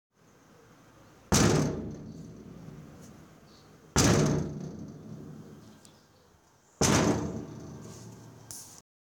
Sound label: Metal Lockers Being Bumped